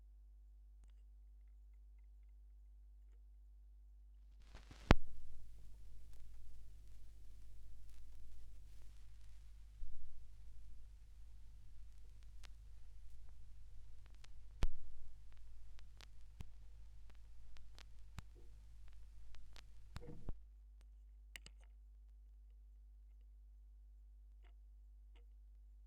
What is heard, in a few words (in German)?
schallplattengeraeusche